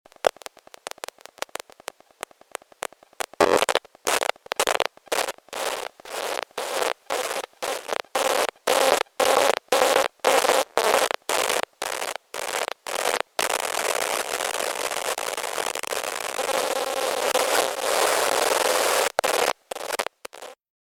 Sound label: iPad wifi